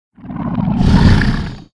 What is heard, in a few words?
boulder crab, monster